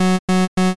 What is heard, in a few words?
machine error